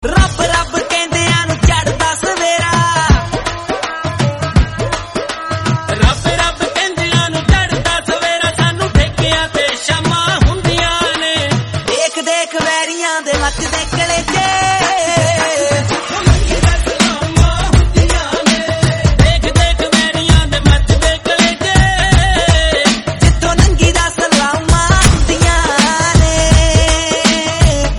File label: Punjabi Song